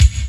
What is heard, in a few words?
drum, X Drum LM8953